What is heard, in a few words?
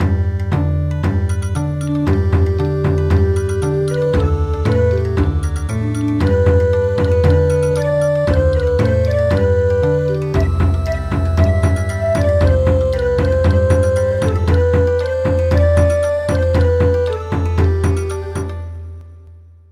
Bon Odori, midi